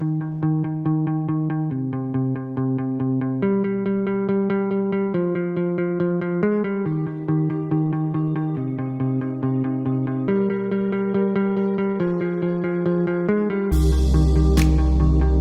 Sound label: ElectricGuitar